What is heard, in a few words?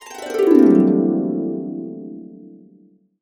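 C2, Complete Harps; USB Soundscan vol.62 - Complete Harps; AKAI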